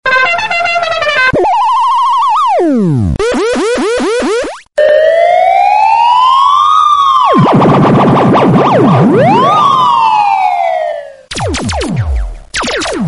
funny bus horn musical tone; samsung ringtones

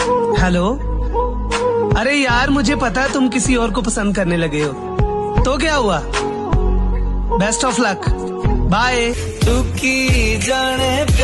bollywood song ringtone

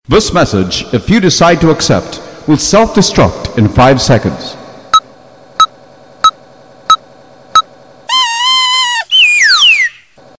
warning ringtone

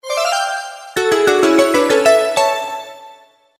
notification sound ringtone

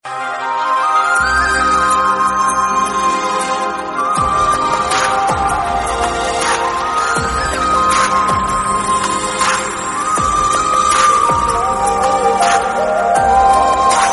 lovely flute background music tone

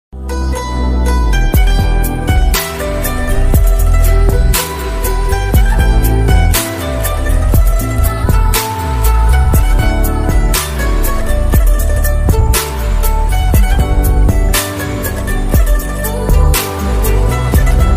indian classical ringtone, bollywood ringtones

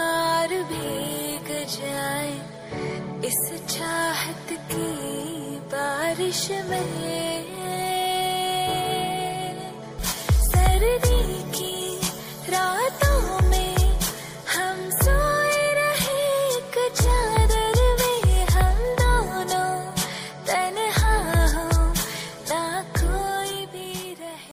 bollywood ringtone

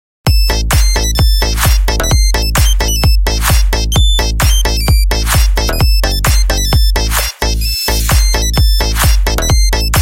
nokia hurdy gurdy ringtone

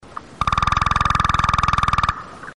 short musical tone ringtone

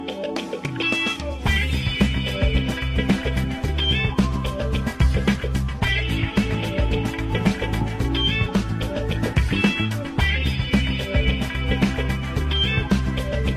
Nokia sad music ringtone; pliable

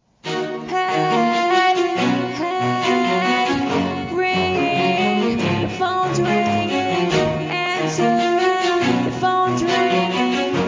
autotune pop musical song tone

